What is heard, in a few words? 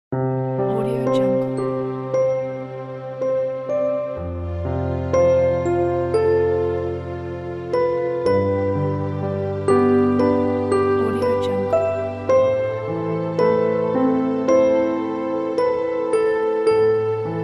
lullaby sleeping